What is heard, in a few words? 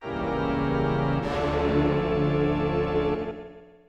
Fortress of Fear Realm Fanfare; Glover; Nintendo 64